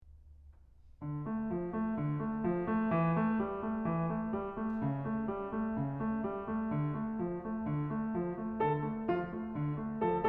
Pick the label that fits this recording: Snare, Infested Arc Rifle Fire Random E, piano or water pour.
piano